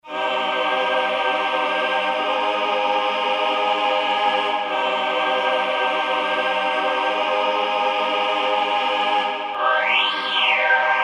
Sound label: Memotron Two